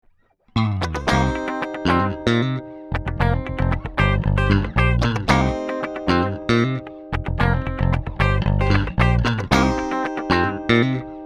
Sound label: DI; без реампа